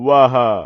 "waha"; SM64 CO-OP; Bob's Burgers

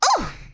ooof; birdo; SM64 CO-OP

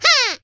punch hoo; yoshi; SM64 CO-OP

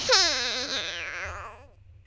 dying; yoshi; SM64 CO-OP

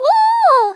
Woah; Miku; SM64 CO-OP; Triple Baka Pack

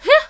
punch wah; peach; SM64 CO-OP

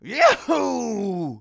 yahoo; foreman spike; SM64 CO-OP

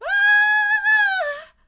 waaaooow; peach; SM64 CO-OP

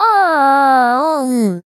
Yawn; Miku; SM64 CO-OP; Triple Baka Pack

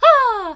punch hoo; peach; SM64 CO-OP

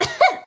coughing; daisy; SM64 CO-OP

